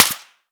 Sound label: sonic impact; Escape from Tarkov